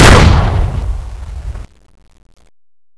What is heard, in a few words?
m79 fire